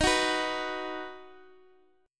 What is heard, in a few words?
D sharp Minor Sharp 5th D sharp, Guitar DADGAD; chordshape